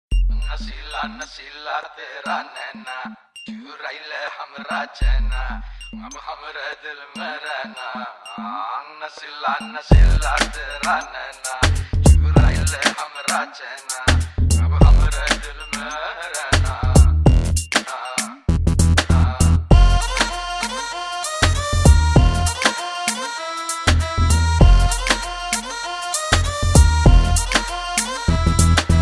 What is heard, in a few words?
My Tone